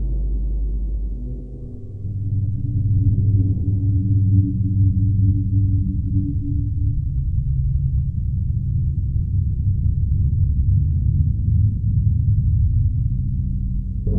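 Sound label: eerie ambience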